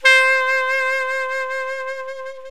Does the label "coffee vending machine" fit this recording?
No